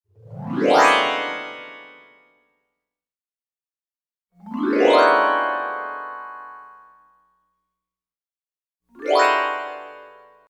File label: Music transition - Various vibraphone washes up x3; lazers